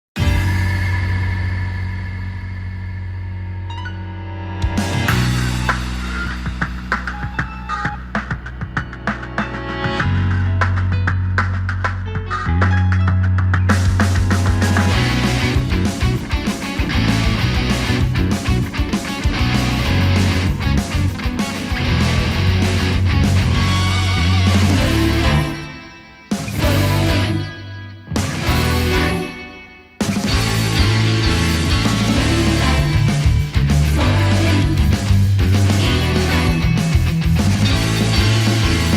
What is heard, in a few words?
instrumental